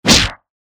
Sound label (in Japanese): 短剣 通常攻撃 モノラル; 武器効果音